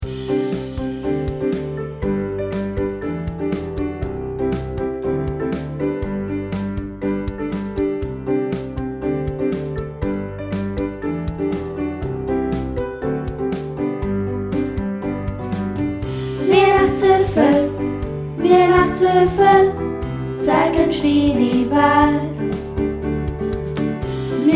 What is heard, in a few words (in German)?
lied weihnachtswuerfel